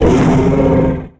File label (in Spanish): Grito de Beartic